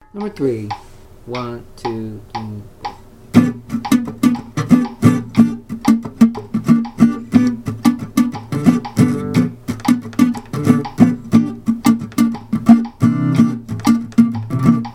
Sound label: claves clave comping 12 bar blues; original clave